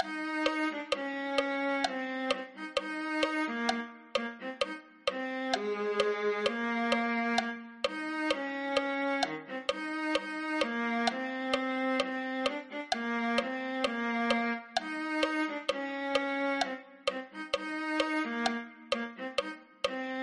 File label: viola